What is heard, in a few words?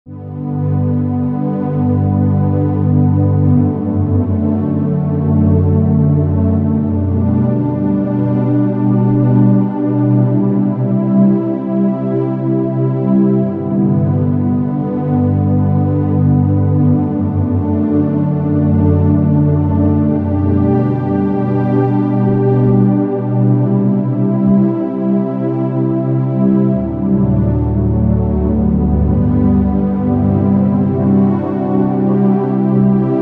Underscore PAD; Worship Flow